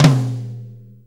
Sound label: 4-4 Tom Flam, Drum Loops